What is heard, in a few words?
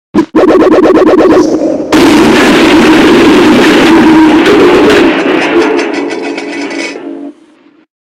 crash sound; greeny phatom